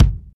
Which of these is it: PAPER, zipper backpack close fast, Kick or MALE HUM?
Kick